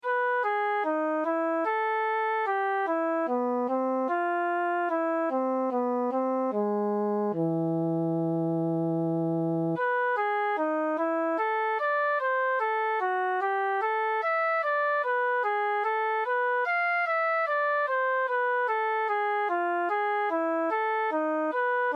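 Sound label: improvisations flute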